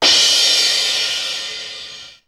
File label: XE8 CRSH; Hollywood Edge; The Big Bad Beatmachine